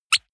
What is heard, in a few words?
squeaker squeeze hard short